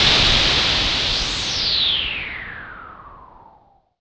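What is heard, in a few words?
FX synth; Sound & Vision - Gigapack II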